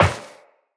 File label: Step Metal Perforated, Footsteps, Walk; Characters; Tenno